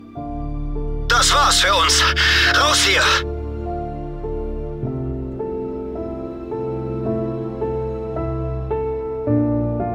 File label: lose; angriff offizier